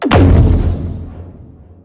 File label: atlas pulse; smite; god atlas